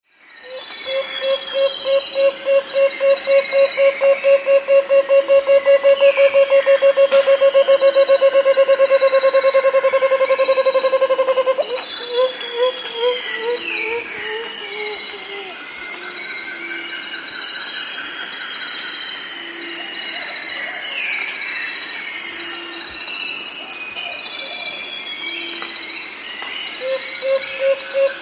Striated Antthrush; S. America

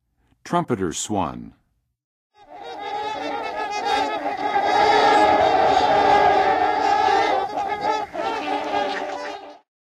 Trumpeter Swan; North America; Western USA; Western USA Songbirds

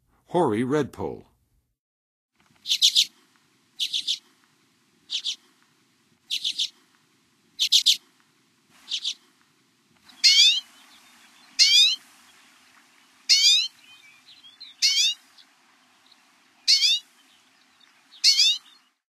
Hoary Redpoll, Songs of Birds of North America; Western USA; Western USA Songbirds

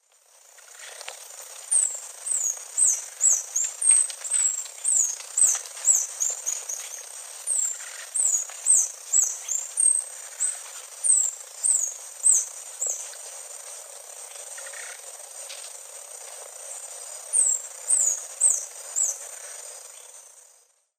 Phaethornis ruber, song; S. America; Eastern Lowlands

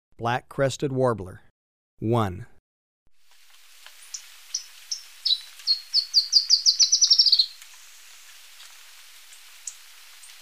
Black-crested Warbler, Birds of Ecuador; S. America

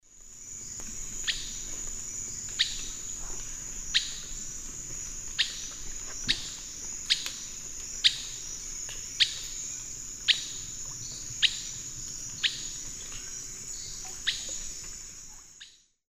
Myiodynastes maculatus, calls; S. America; Eastern Lowlands

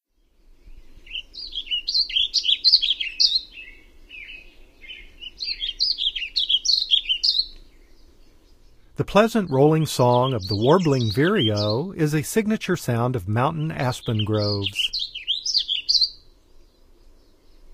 Warbling Vireo, Bird Sounds; North America; Common Western Species; Know Your Bird Sounds

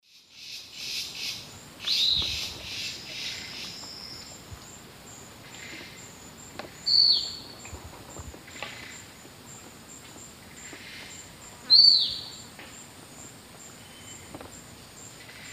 Sublegatus obscurior, calls; S. America; Eastern Lowlands

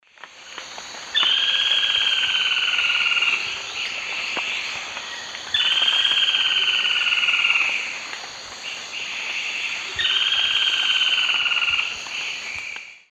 Automolus infuscatus, song; S. America; Eastern Lowlands

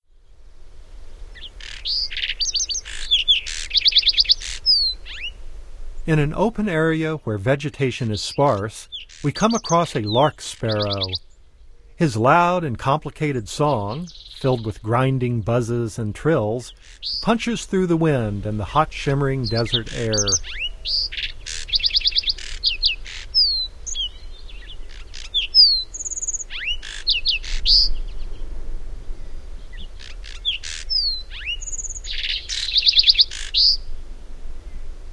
Lark Sparrow, Bird Sounds; North America; Common Western Species; Know Your Bird Sounds

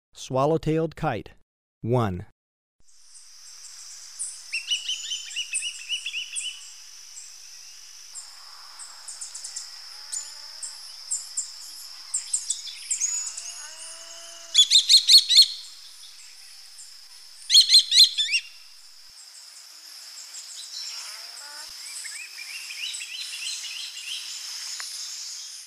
Swallow-tailed kite, Ecuador; S. America

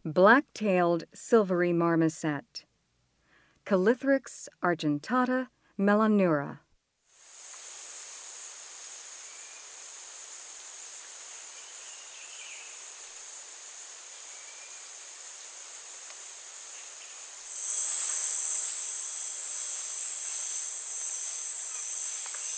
Black-tailed silvery marmoset; S. America; Rainforest

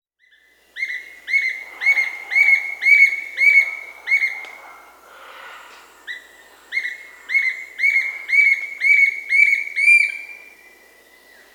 Bright-rumped Attila (Attila spadiceus), Peru; S. America

